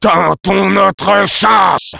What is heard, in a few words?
Sniper battle cry, fr; "Tentons notre chance."